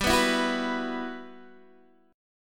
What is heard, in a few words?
E Suspended 2nd G; Esus2/G; strummed; JGuitar